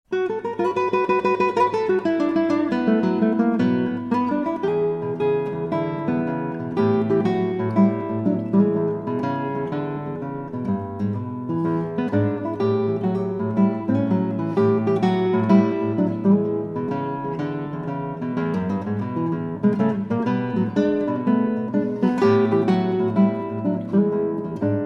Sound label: guitar; usa